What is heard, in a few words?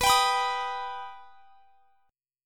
B Augmented B down; strummed